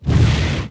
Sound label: fireball; FantasyTennis